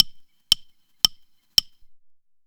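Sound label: WOODSTICKS GROOVE; Best Service; World Colours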